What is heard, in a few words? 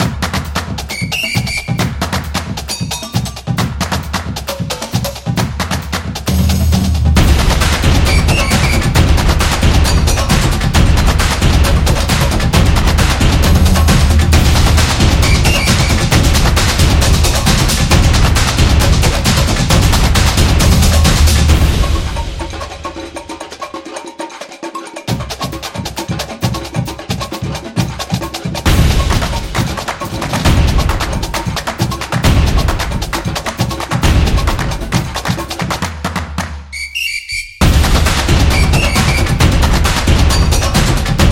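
Samba, Football percussion background music inspired by samba rhythm, stadium energy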